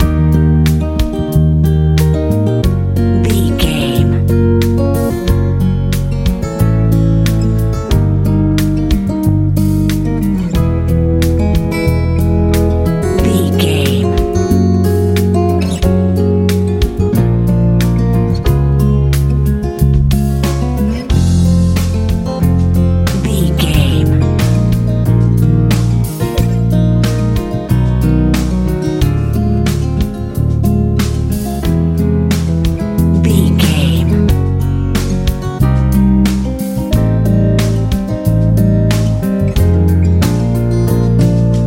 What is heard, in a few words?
ballad